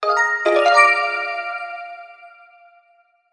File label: Main Menu Start Game; Start the selected game; Skyward Sword; zelda